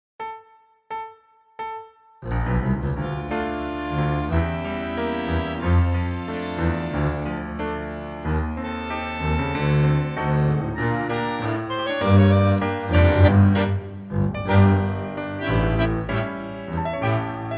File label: Dm